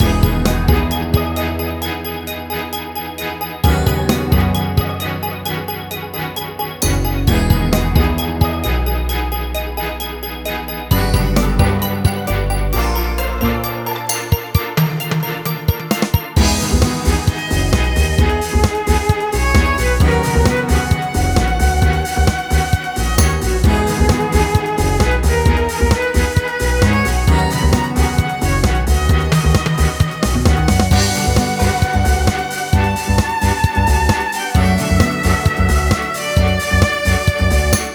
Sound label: Dungeon; legend of the tetrarchs android ios ps4 xbox one gamerip 2018